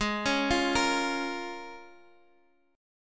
Bb Minor 7th Ab

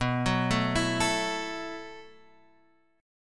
B 7th Suspended 4th B down Guitar Half Step Down; B7sus4

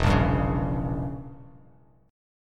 E Diminished 7th E, Bass Standard

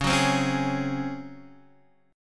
C sharp Major 7th Suspended 4th, Guitar, DADGAD

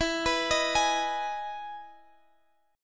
E Major 7th E; chord shape

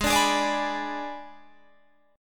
G sharp Diminished G sharp, down, Guitar, Open G

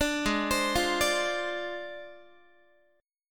G sharp 6th Flat 5th G sharp Guitar; G#6b5; 17,16,15,15,18,x; arpeggiated

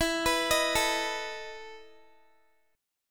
E Major 7th Suspended 4th E down Guitar; EM7sus4 arpeggiated

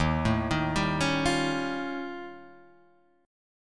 D sharp 9th Flat 5th D sharp; D#9b5 arpeggiated; JGuitar

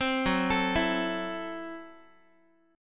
F sharp Minor 7th Flat 5th F sharp Guitar; arpeggiated; JGuitar

